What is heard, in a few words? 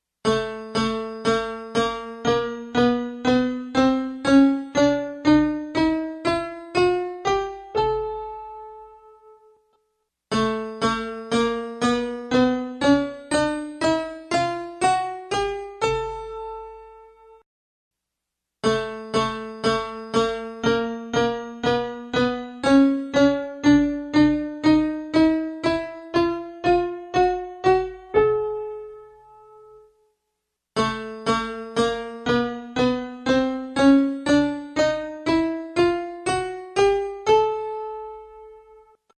Bamboo Scale Piano G2